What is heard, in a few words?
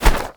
spear stab